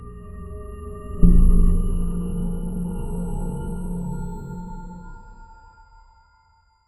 craft ui sounds with an, menu open

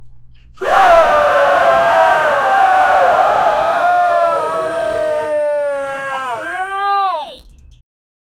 people running away from, dinosaurs

